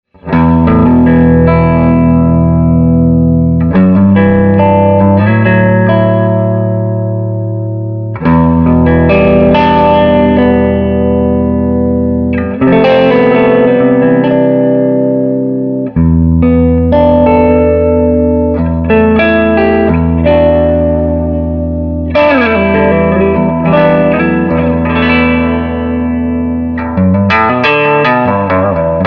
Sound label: SG clean, Gibson Historic SG, amps Tremolo and Reverb; Swart AST Pro; Fender Bajo-Sexto Baritone; The only effects are from the amps Tremolo and Reverb